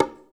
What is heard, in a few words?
mid conga; Drum Samples; Mid conga sound